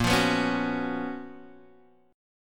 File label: Gb Major 11th Bb, chord